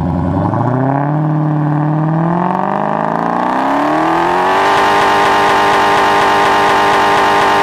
rev, challenger hellcat

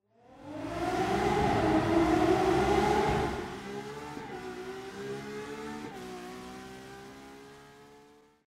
intro car; gmod; paris

